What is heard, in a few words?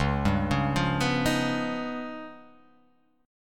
Db 9th Flat 5th Db down; arpeggiated; JGuitar